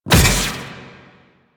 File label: Hammer Shield Block B; Crp Hammer Shield